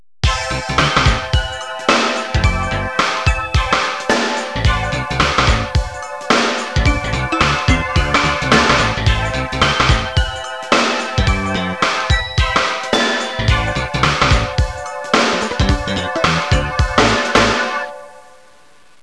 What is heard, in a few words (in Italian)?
suonerie businessy